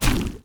Slime God Shot

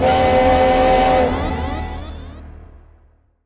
Enraged; Ares

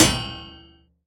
ExoHit

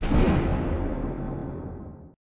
Heavenly Gale Fire

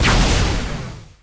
Opal Fire

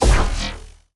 Charge

